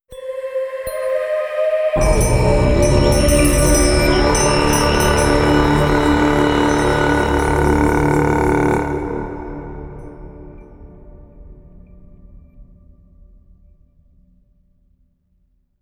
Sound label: Afterlife Round Start